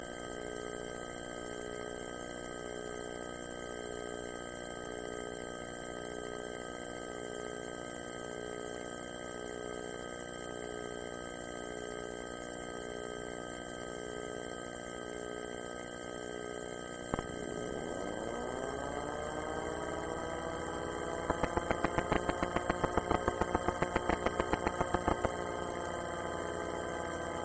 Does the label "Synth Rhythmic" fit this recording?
No